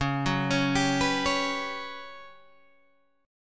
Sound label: G Major 7th Flat 5th Db